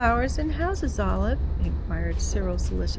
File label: noisy